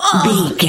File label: voice female hurt pain low